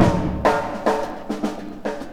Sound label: bassless, Drum loops